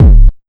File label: BD, Big Beat Drumloops; USB Soundscan vol.25; AKAI; NYC 125